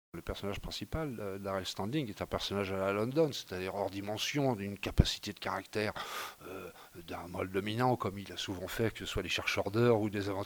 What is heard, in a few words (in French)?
riff; personnage principal